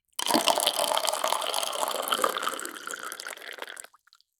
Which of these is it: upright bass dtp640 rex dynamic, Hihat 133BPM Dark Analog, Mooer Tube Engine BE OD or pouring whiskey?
pouring whiskey